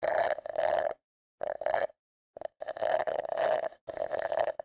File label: drinking with a straw; human; NS Studios - ultra sound collection